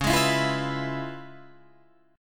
C sharp 7th Sharp 9th, C sharp, down, Guitar, Open D